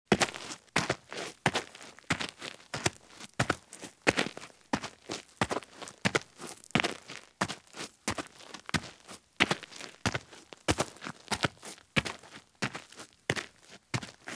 Heavy Boots Walk at Fast Pace on Roadside or Driveway Gravel; Sound Ideas; Footstep Sound Effects